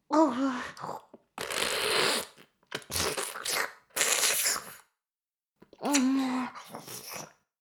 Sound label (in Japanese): フェラ音声, ASMR; 少尉; 牧場戦腺